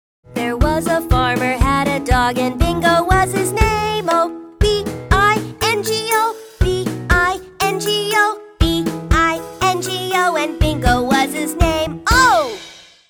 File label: US Songs